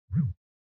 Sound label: Cell Phone Vibration Blanket